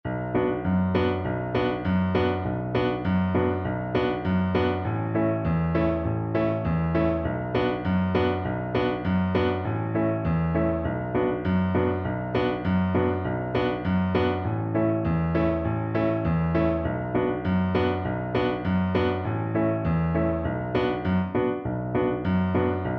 drunken sailor, Double Bass

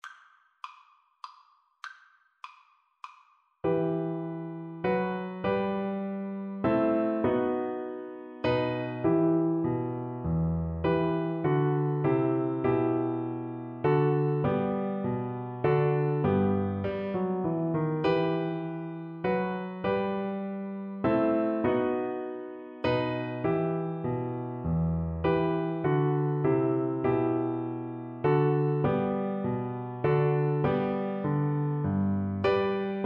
minuet in d minor, Cello; bach; bwv anh 132

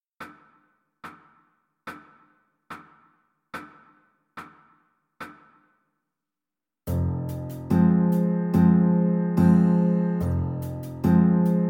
bella ciao, Trombone

